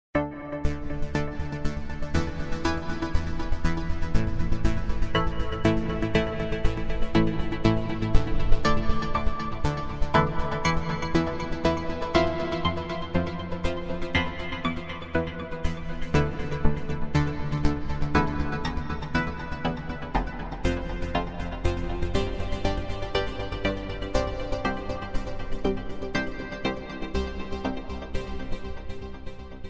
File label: LFO SYNC